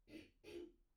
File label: clear throat; IEEE AASP